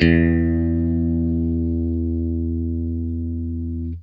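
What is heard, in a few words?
Stratocaster, Guitar; Quantum Leap; 90's Sample CDs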